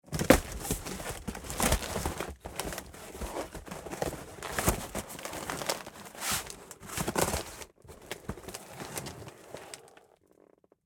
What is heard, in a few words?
opening a large cardboard package; fvtt sonniss gdc audio